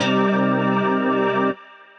Glass Stab, Synth Lead; Ableton; Skitter and Step

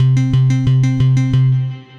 Arps; Arps Of Orion; Melodic Arps

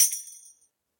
TAMBOURINE; Ableton; Factory Packs; Drum Booth

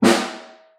Far, Snare

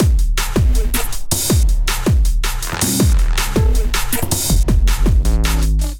Kit Melodic 160 bpm; MIDI Clips; Drums; Flutter Kit; Ableton; Factory Packs; Skitter and Step

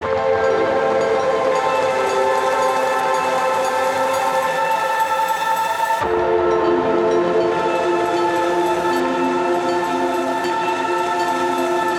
Aching Pads FMaj 80 bpm; MIDI Clips; Tonal; Atmospheric; Ableton; Factory Packs; Mood Reel

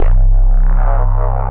Razor Stubble Bass, Synth Bass; Ableton; Factory Packs; Beat Tools

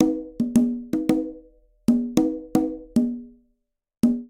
Bomba Conga Tumba-223bpm; Latin Percussion; Ableton; Factory Packs

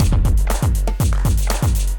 Kindified Kit, Drums; Kindified Kits

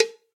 Cowbell Big Steely Tight; Drums; Drum Hits; Standard Hits; Ableton; Factory Packs; Session Drums Studio

